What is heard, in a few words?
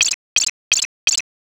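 footsteps bush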